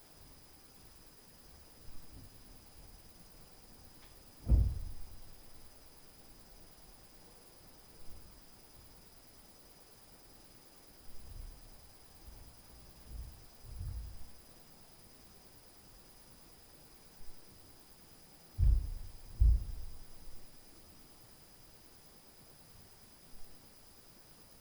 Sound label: UPSTAIRS LF BOOM NOISE, Night Noise